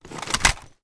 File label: sm raise; gmod